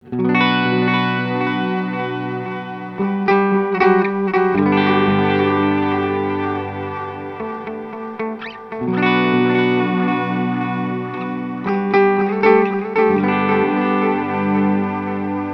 Earthquaker Devices Disaster Transport max delay time mix max slow mod speed; speed 12 Uhr